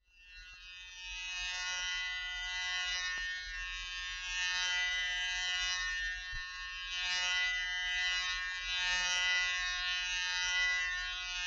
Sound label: Droney Buzz; Vintage Cartoon; Foley